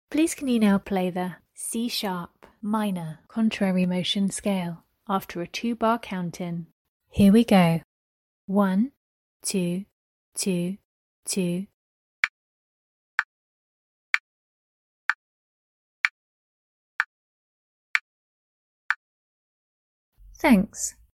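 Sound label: c sharp min contrary motion scale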